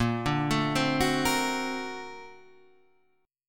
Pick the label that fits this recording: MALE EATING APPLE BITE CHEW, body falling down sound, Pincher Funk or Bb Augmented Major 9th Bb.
Bb Augmented Major 9th Bb